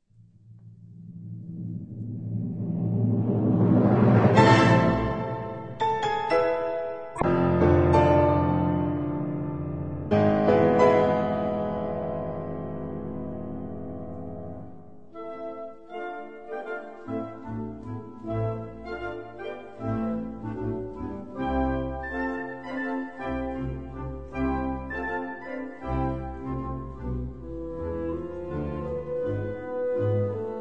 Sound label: Piano Concerto A Minor